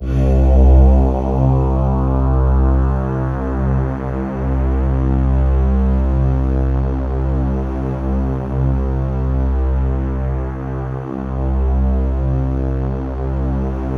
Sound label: C1, Synth Leads